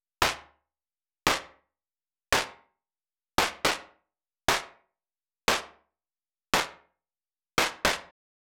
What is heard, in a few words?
114 Fife N drum Snare